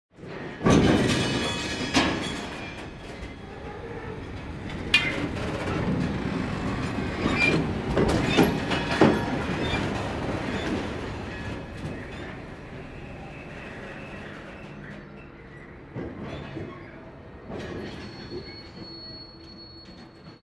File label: shunting track, train; best service Blue Box